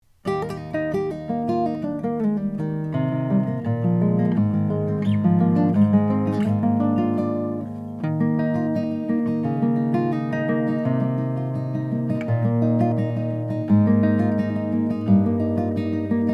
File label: langejans guitar exclu bra